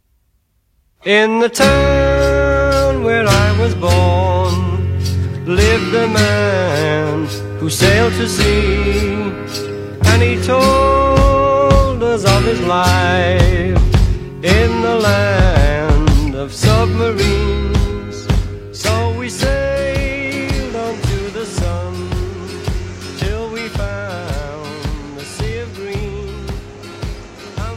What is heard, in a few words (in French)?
Chanson